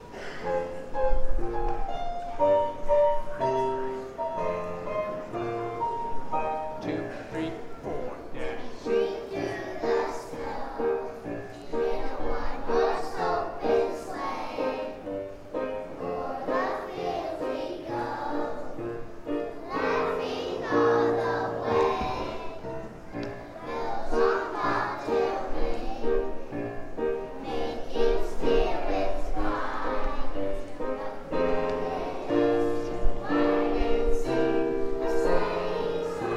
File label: jingle bells